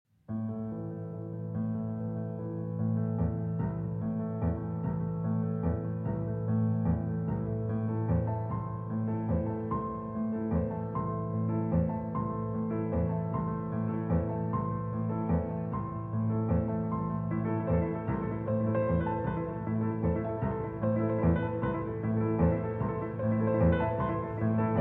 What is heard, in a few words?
Piano Duet; VII. Cocoon of Shawls